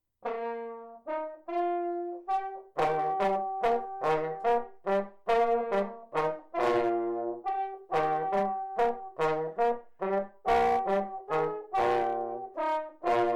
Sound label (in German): Glocken Walzer, parforcehornmusik